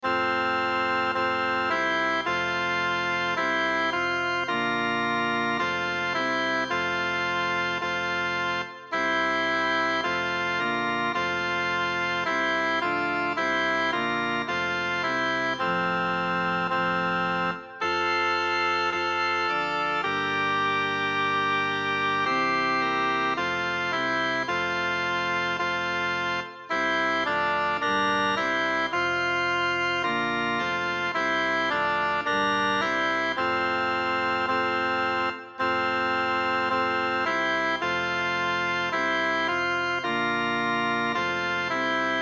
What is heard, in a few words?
alta trinita alt; anonymous 15 th century